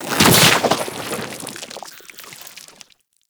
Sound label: Fungus Explo; Venus Open World; Venus Landscape